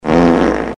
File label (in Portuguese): peido